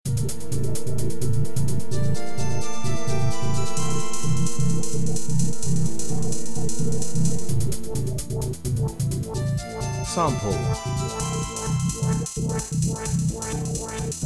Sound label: disco medium